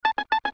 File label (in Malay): geleng kepala sfx